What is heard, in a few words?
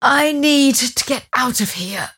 teleport pain; dota; mirana